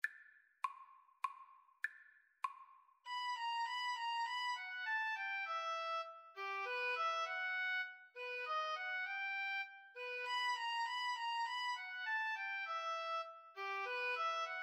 fur elise, Oboe Duet

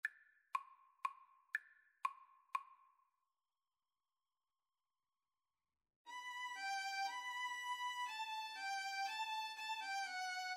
Spanish Dances, Violin Cello Duet; Grandos

